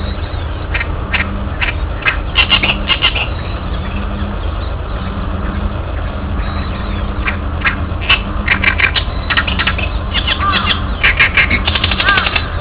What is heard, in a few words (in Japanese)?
ooyoshikiri; KitamotoShizenKouen